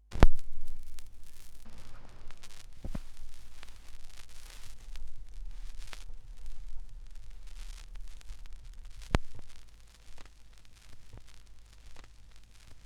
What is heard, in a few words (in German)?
schallplattengeraeusche; rauschpartikel